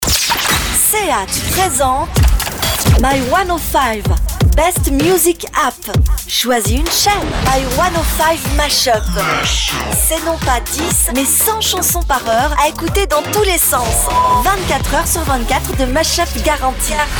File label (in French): Jingle